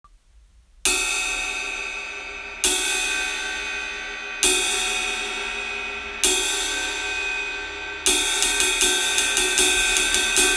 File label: Ride moderate, percussion